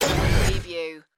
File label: starship sliding door